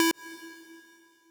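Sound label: square lead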